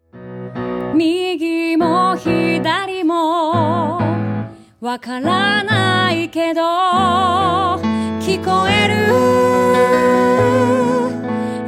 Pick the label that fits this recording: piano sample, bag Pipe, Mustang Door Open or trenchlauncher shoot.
piano sample